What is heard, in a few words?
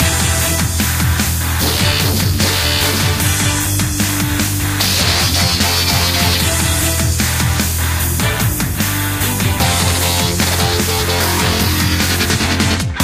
Battle